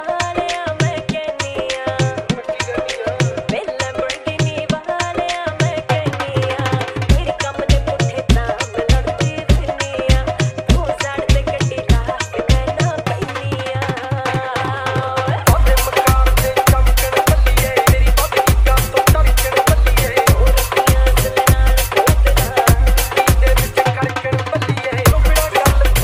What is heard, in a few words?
dhol remix ringtone